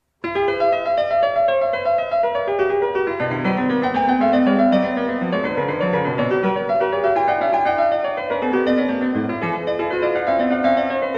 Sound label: 2 voice Fugue in E minor; JS Bach